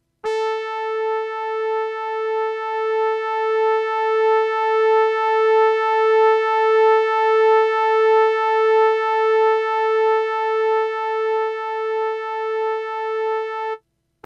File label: e-50 dual horns